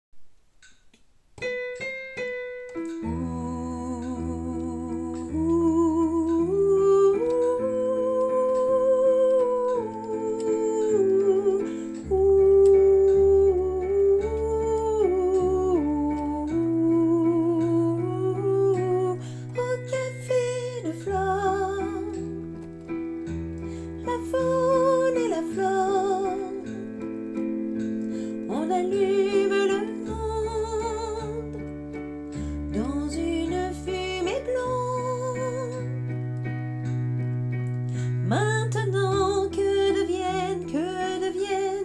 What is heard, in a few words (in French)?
valses de vienne soprane